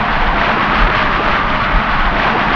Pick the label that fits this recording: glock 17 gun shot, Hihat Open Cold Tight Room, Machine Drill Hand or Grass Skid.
Grass Skid